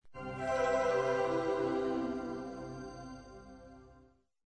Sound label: Power down; Motorola RAZR V3xx Cingular